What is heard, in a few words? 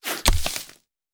Bullet Impact; Sound Ideas; Guns